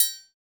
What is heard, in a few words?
TRI, ETHNIC PERC; Roland - LA Composer vol. 1